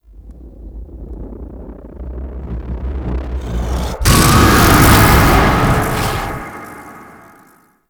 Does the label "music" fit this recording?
No